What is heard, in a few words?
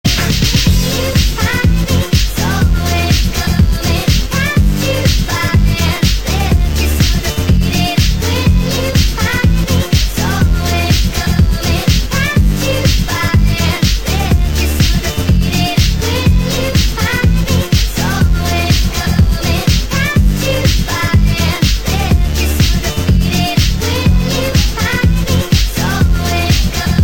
elektro house music